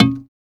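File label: percussion; E-Lab; X Static Goldmine 1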